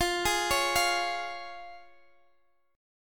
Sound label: F Minor Sharp 5th, chord